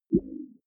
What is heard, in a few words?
query smooth low